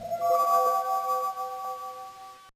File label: Opening Jingle; fire emblem 7 rekka no ken gamerip